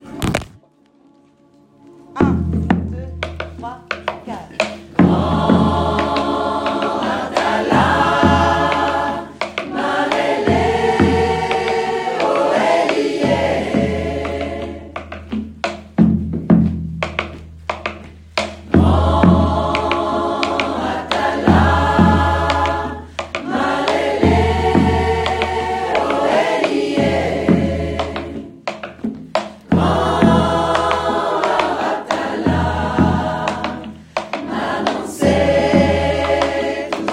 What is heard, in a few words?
tutti, singers; Outdoor-Events; Deblozay